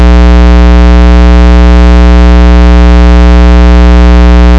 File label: rain